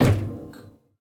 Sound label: metal step; Golden Crayon Sounds